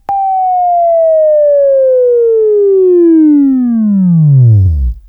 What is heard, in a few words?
WAV Left, Sweeping; Sweeping Training program